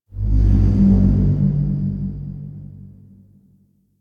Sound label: Metal Rumbles; Ambience; Warframe; The New War; Quests; Zariman